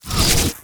bonus Arrow Shot Ice; netent

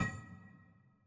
spin button down; netent

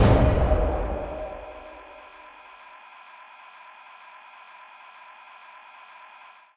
dracula stacked Attention; netent

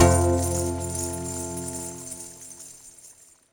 Win Big End; netent

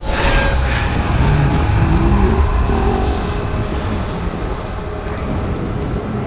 near win; netent

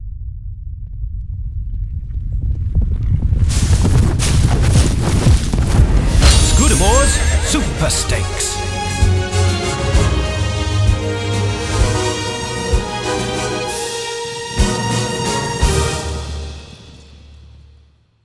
intro Sound; netent

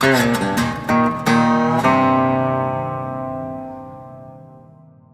large Win Riff; netent

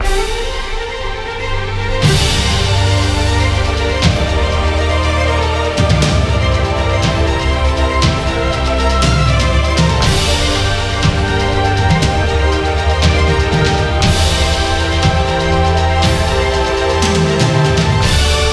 music bigwin; netent

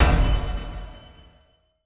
coin Bump; netent

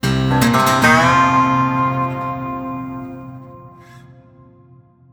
medium Win Riff; netent

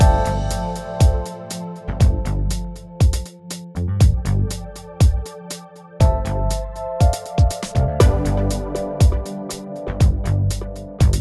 game sounds Main Game

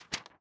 real reel bounce; netent

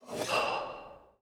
movement walking wild griffin last reel

